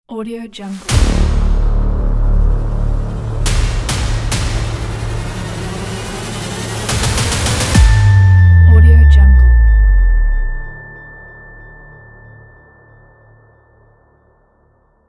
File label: epic teaser trailer logo